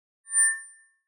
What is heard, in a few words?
Microphone Feedback - Short Classic; Hollywood Edge; sound designer's toolkit v2